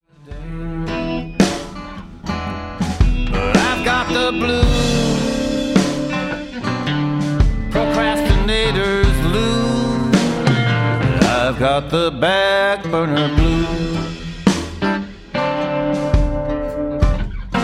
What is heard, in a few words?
blues